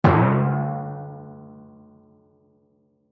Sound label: Timpani Hit Swell Bounce Funny; Cartoon; Musical; Blastwave